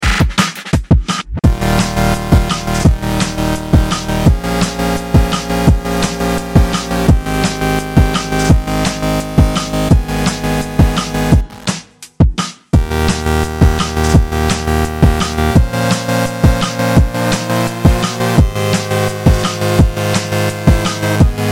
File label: Drum and Bass and Waltz